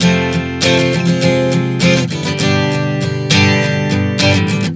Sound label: F-Fm-G 100 bpm, acoustic guitar chord progressions